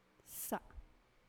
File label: grass